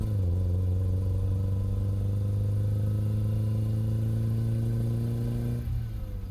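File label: engine low loop; Blind Drive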